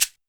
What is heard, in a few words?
TR626 Shaker